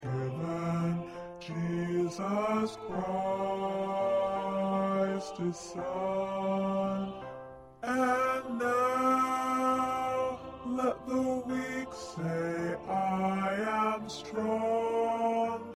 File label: Give Thanks Baritone